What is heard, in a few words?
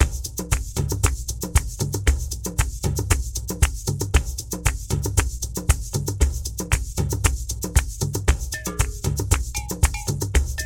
Drum Dialog